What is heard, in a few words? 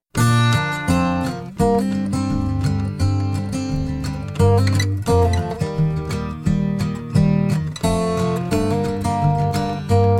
Extract C Maj